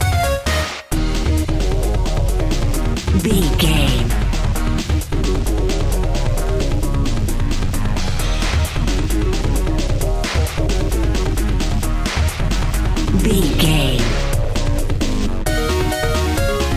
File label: big boss battle video game